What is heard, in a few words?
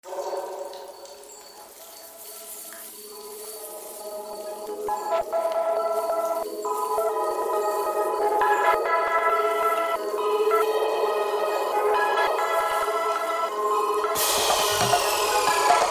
Drum Kit